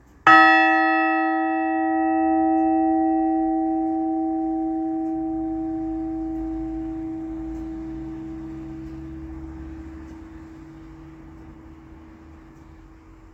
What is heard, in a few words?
bells of sussex; balcombe